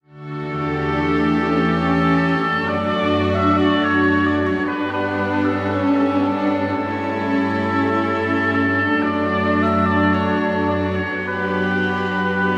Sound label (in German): Oboe